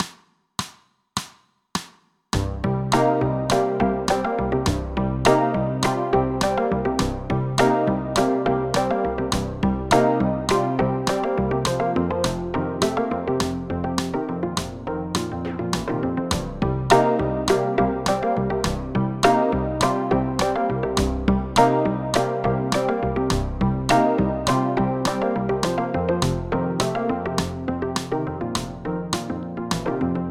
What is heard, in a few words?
synth solo; funkastic; sampleson